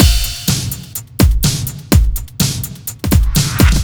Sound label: Metal Full, Drum Loops 125bpm, Nu Dance Electro; Zero-G; Soundclash Nu Dance Electro; Acidized Wavs; Tommy Metal